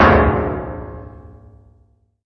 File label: METAL KNOCK